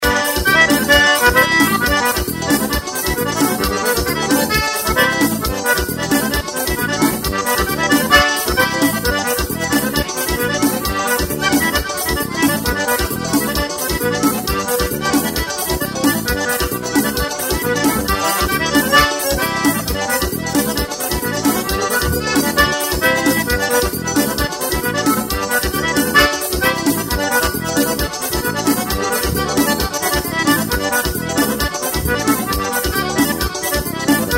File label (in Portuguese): acordeon solo